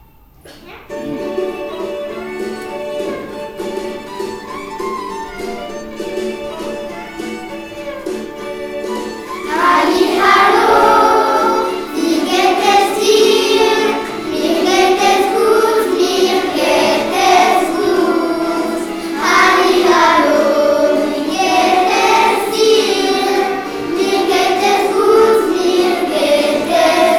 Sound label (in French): Guten Morgen Chanson en allemand